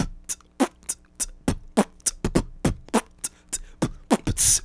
Beatbox; Beatboxing

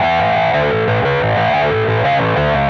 Electric Bass

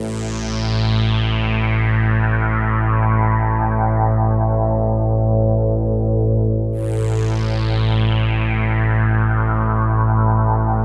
Pad, Synthesizers

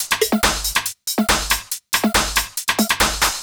Electronic Percussion

